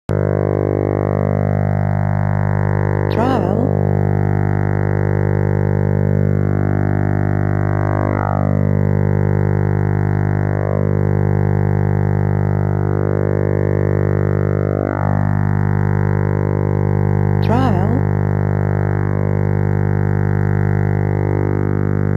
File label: radar